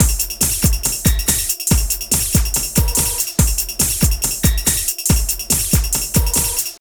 Drum Loops

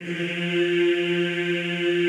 EEH F2, Classical Choir; Best Service; Extended Classical Choir

